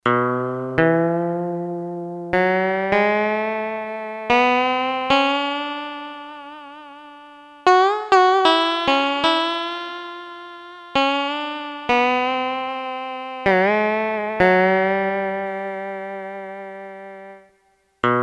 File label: STEEL, Electric Guitars, Korg DSS-1